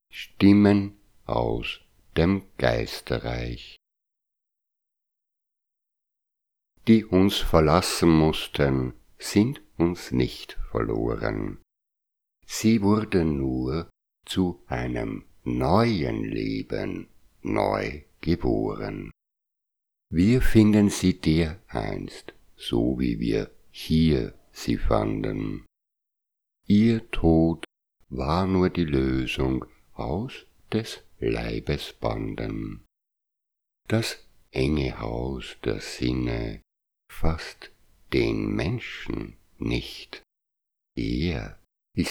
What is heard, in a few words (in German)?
stimmen